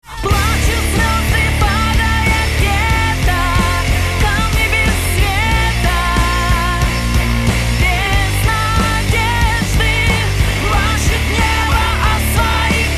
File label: gong